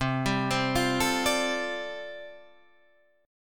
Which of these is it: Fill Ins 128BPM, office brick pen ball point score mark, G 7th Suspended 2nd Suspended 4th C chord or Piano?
G 7th Suspended 2nd Suspended 4th C chord